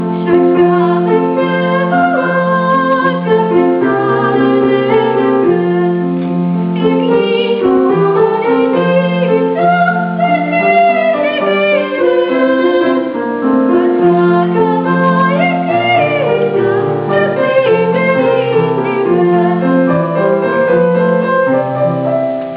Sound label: vocal